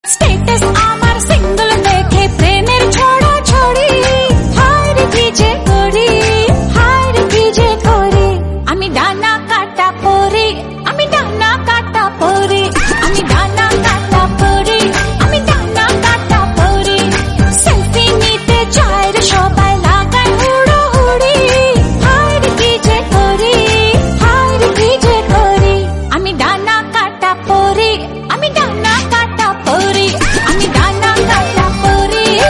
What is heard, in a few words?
Bengali song ringtone